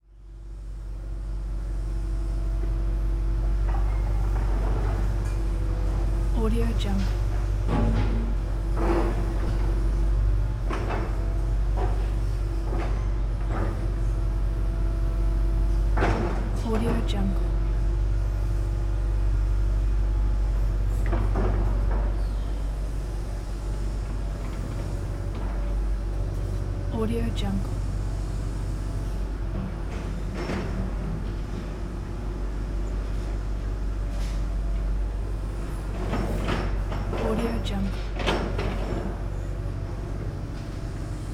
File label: quiet construction ambience